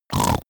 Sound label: snore short; soundpool